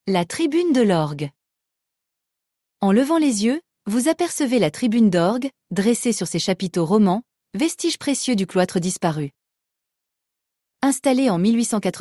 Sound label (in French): orgue; tribune